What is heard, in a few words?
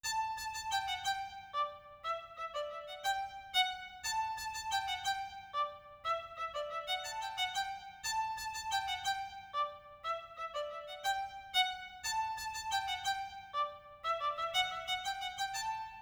Violin